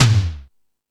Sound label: Fusion Stix, E-MU Pro-Cussion; drum machine